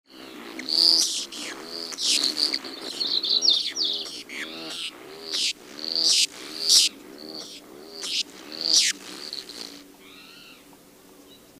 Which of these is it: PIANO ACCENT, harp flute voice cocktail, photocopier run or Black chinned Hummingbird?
Black chinned Hummingbird